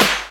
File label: SD, dance drums hip